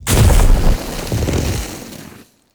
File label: Drunken Flame Pillar Burst; Characters; Enemy; Abilities; Circade